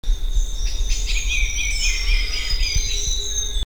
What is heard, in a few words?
Habia rubica